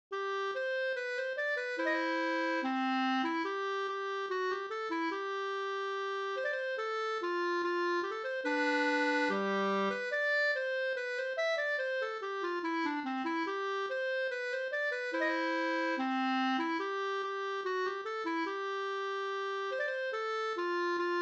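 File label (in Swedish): brudmarsch Gaslaten Uppland; Gas-Anders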